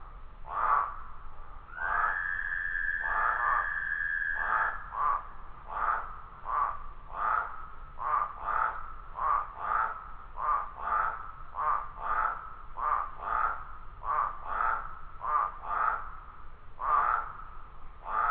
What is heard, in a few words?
Wetland Spadefoot Toad Chorus